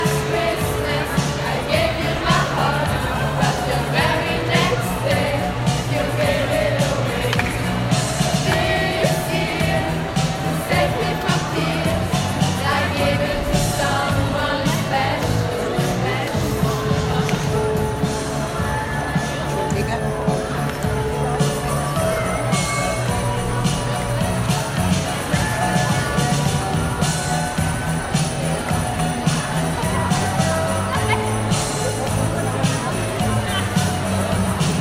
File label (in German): Chor; Weihnachtsfeier